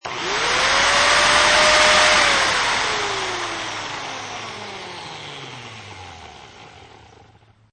Orbital Sander Short, Orbital sander, start, shut off and slowly come to a stop; Also good as ceramic drill sound; Sander Sound Effects